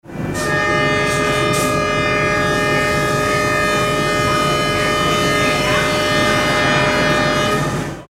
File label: train horn